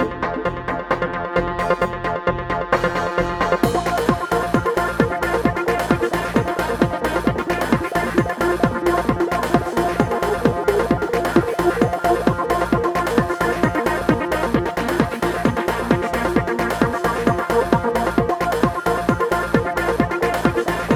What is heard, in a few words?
BoomBox Track loop